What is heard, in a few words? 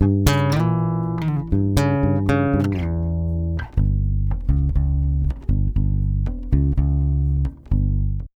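120 bass G diminished, brazil bossa; USB Soundscan; AKAI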